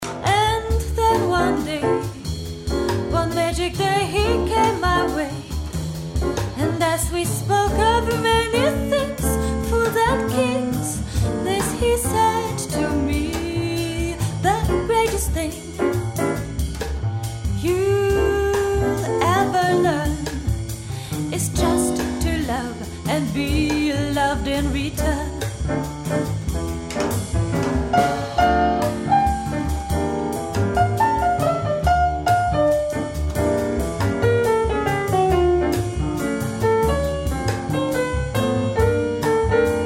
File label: quartett latin